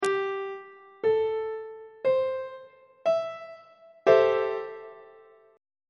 g double sharp minor 7th 3rd inversion on treble clef